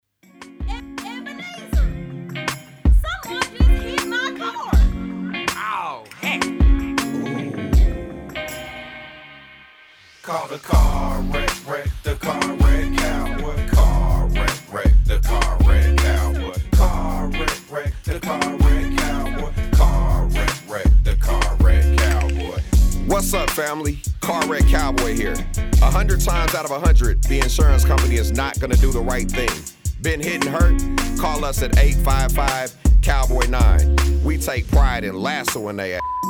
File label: slower beat commercial